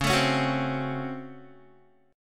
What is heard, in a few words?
Db Major 7th Suspended 4th Sharp 5th Db Guitar; DbM7sus4#5 strummed; JGuitar